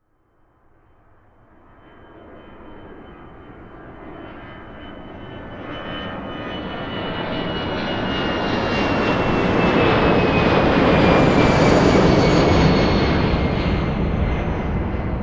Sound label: transportation aircraft commercial jet pass low external; sound effects one